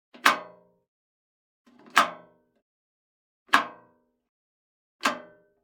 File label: Generic Button Metal Medium